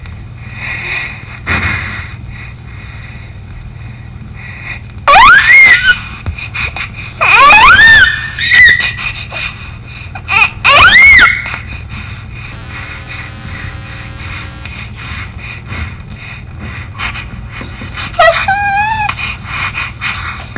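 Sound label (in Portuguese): acidose hipervent, sons e choros pediátricos; ACIDOSE - HIPERVENTILAÇÃO; SONS E CHOROS PEDIÁTRICOS CARACTERÍSTICOS; PEDIATRIA UFF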